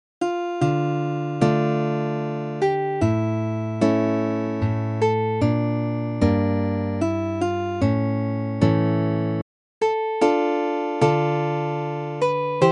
lute suite in d minor sarabande; visee

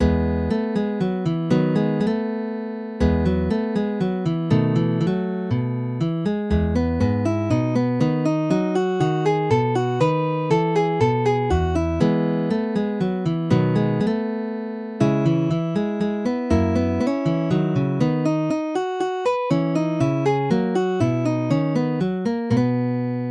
lute suite minuet; bach

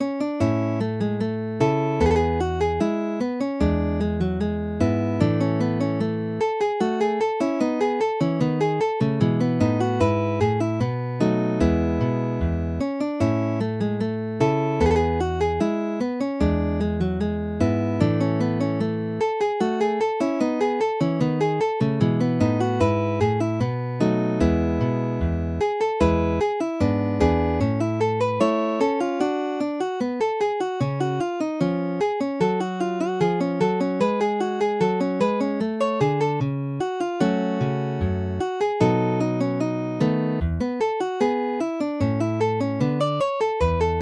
bach cello suite no3 bouree i

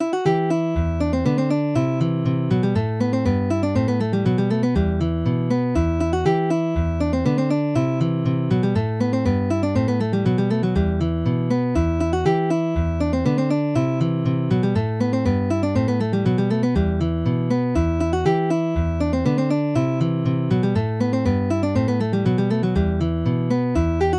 purcell hornpipe, guitar

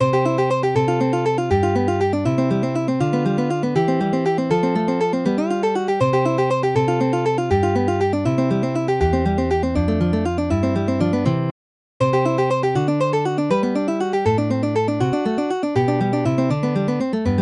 barrios estudio en arpegio, guitar

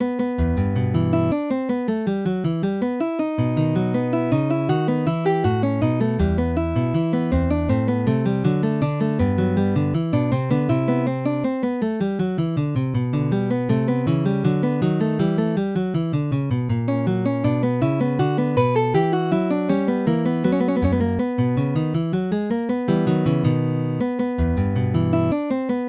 bach lute suite no1 allemande, guitar

